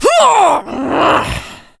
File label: pain, female